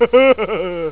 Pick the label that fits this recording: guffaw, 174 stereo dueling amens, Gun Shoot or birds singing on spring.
guffaw